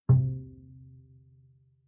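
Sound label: Bass pizzicato fortissimo sul A C3, Double Bass; MIS Pitches - 2014; Strings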